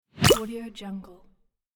casual cartoon appear

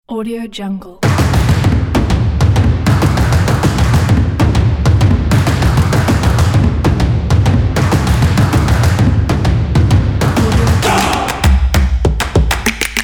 inspiring stomps and claps

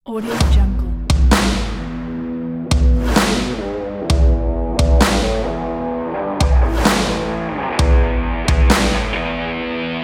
extreme sport

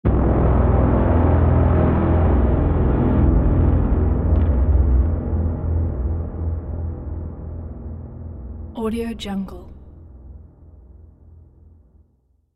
braam impact; blackalexstudio

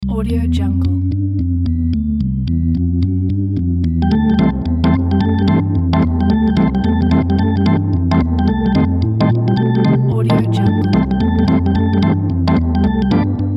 sports arena organ

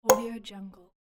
notify pop alert

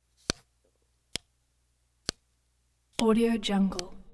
nikon nikkormat 35mm camera focusing lens swish

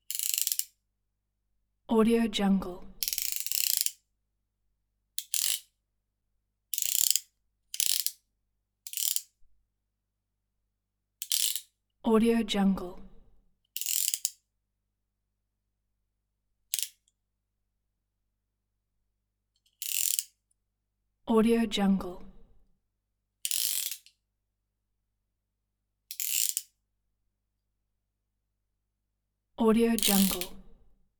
stationery knife

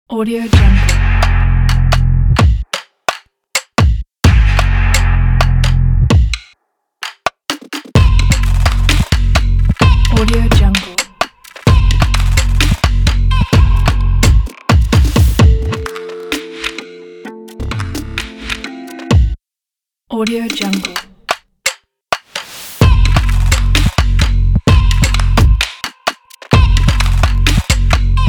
stomp opener

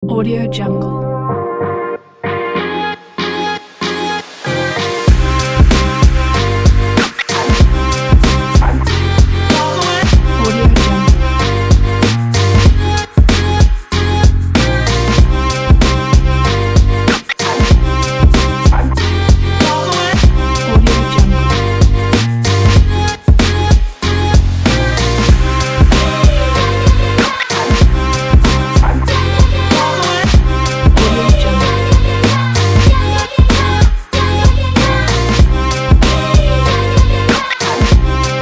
hiphop

